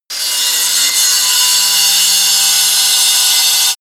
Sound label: grinder bright on load